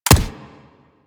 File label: Weapon Punch Rifle